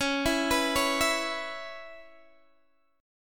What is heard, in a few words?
Db Minor 7th, Guitar, DADGAD; arpeggiated; x,16,14,16,16,14; Dbm7